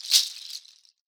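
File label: shaker, Synclavier Percussion, World & Orchestral; Synclavier Percussion 2; ILIO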